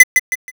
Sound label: tap